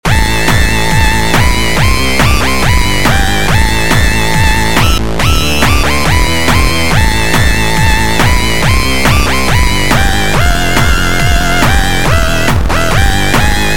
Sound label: Bzzzzzzzz Drums